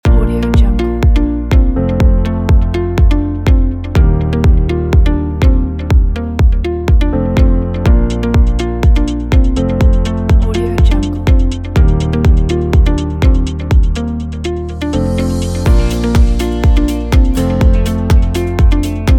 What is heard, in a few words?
motivating corporate